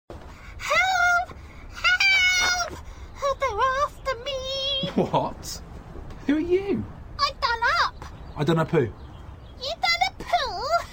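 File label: Most Annoying Creature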